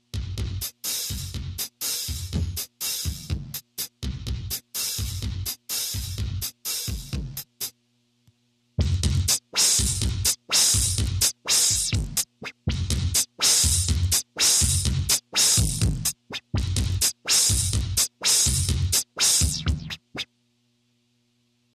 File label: envelope follower synth drums